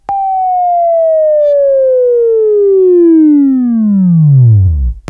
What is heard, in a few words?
Buzz; Labview-Program; Sweeping Training program